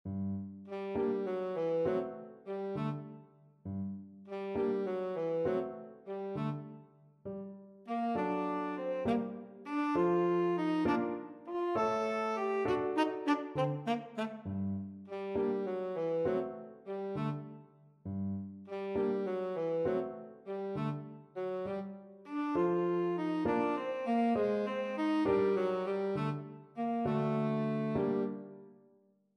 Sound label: tenor saxophone